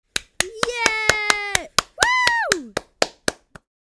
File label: Cheering boy child kid